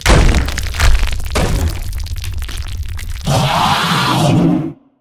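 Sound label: real undead golem spawn, monster; thedead3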